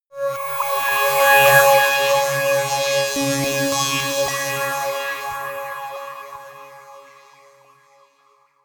sci-fi new galaxy, drones

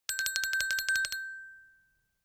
glockenspiel tremolo high short; percussion

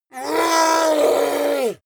yeti attack shout aggressive painful; schreie

